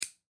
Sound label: Human Nails Clip Single; Blastwave